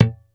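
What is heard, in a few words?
A0 dead, stick bass; D-STIK BASS1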